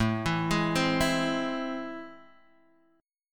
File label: A Minor Major 7th, down, Guitar, Drop D